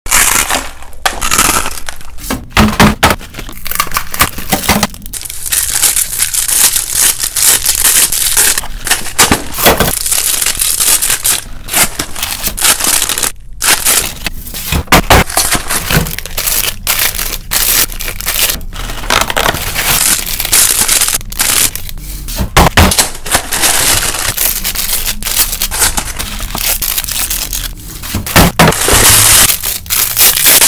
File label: Freeze-dried ice cream, خوردن بستنی خشک